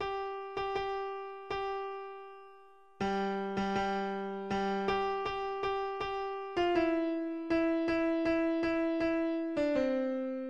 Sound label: nessun dorma, keyboard